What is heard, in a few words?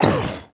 exhale fall